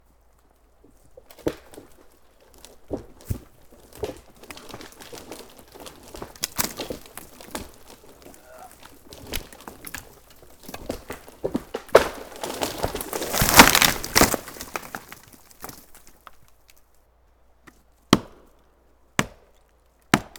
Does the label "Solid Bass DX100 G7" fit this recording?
No